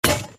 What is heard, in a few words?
chest break; smbx2; Redstone NPC Pack